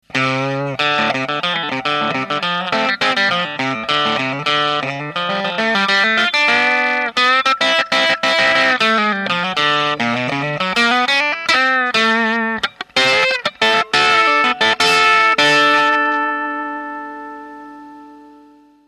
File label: Boost Drive Third Fulldrive